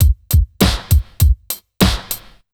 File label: 100 BEAT, Underground Hip Hop; USB Soundscan vol.02; AKAI